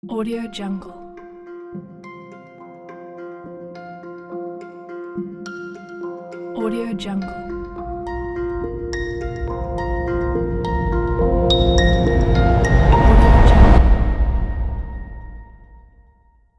magic logo

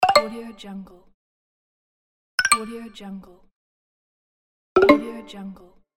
game pop up

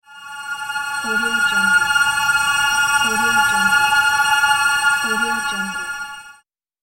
holy aura; interestingmedia

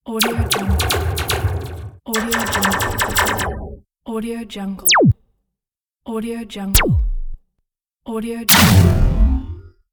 laser shot

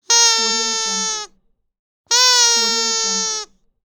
party noisemaker

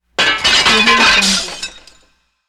broken glass

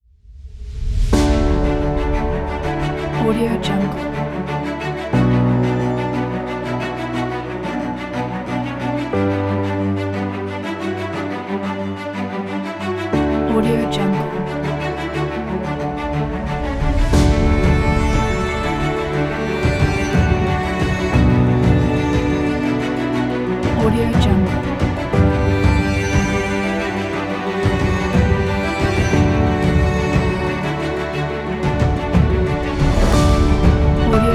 inspiring cinematic; rapirasmaks

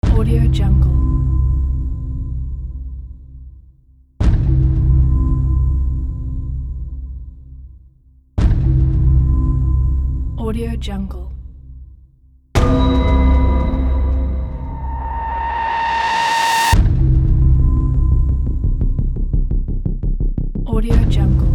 cinematic dark cyber

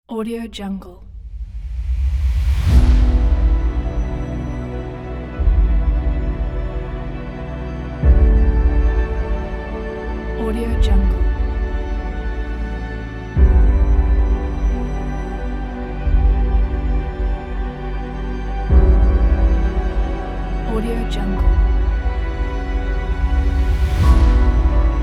drone footage opener

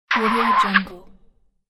cartoon braking skid; fxprosound

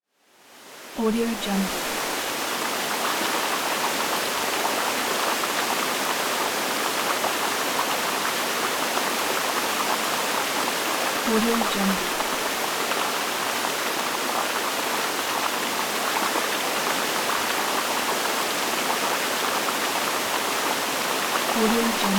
heavy waterfall

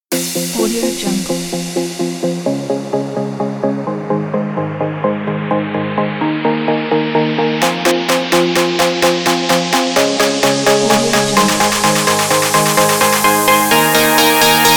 an electronic

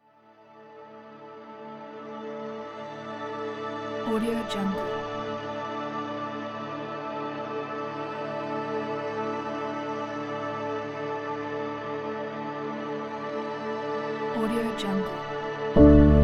slow piano; just mary

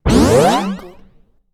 movement

